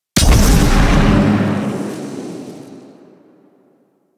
Radial Javelin; Warframe; Warframes; Excalibur Umbra

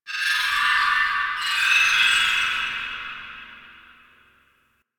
Guild High Tech; Ambience; Warframe

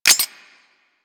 Uzi Pistol Mech Last Shot, weapons; Grn Uzi Pistol

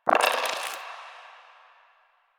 Roll Dice; Warframe; UI; Cetus Vendors

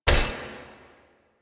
Pod Shotgun Last Shot; Weapons; DE; Warframe; Tenno

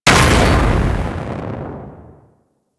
Weak Spot Explosion; Warframe; Sargas Ruk

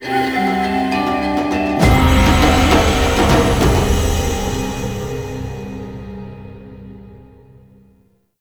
Bounty Complete Stinger; Warframe; Nokko Colony; Vendors

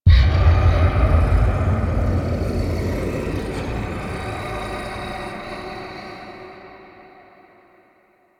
Transmission Start; Entrati Labs; Evil Twin

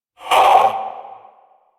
Possessed Vocal Pain; Enemies; Warframe; Priest Quest; Possessed Red Veil

